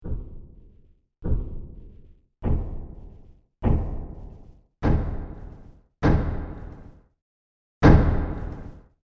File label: Creepy Knocking Horror Suspense; Creepy Door Sound Effects